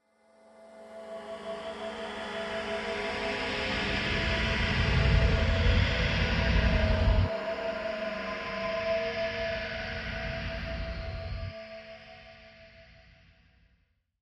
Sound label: Horror Swell Vox Reverse Metallic Feedback; Scary Tones; Blastwave